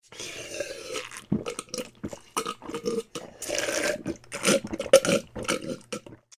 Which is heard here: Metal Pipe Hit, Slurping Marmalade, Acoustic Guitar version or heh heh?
Slurping Marmalade